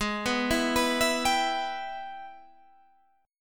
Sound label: G sharp Minor Major 7th Sharp 5th G sharp down Guitar Standard; arpeggiated; JGuitar